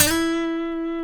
F#, funk guitars

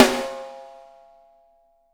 6X12 WOOD; Sampleheads; Peter Erskine Living Drums

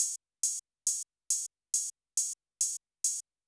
CR78 RIDE, Techno 138 BPM; Best Service ProSamples vol.54; AKAI; HELLS DOOR

